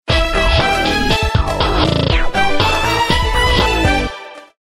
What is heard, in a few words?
Baroque Dream